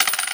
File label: drop